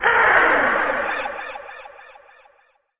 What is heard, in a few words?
phoenix angry